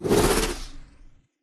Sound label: Cloak Interrput; Titanfall 2